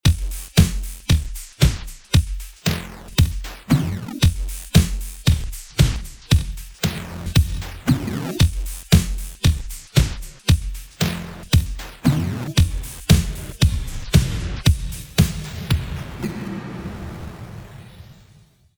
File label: Drums Wet; United Plugins Cryostasis